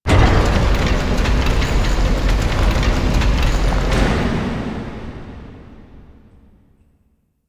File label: Door Hands Open; Warframe; Entrati; Vaults